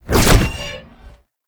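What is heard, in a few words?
cool lava; Enemies; Abilities; Warframe; Tau; Dax Ninja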